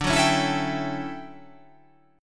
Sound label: D Major 11th D down